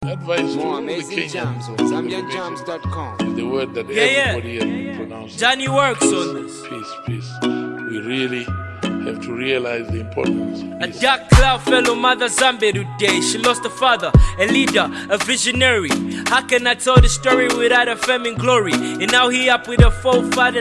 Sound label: Heartfelt Tribute Song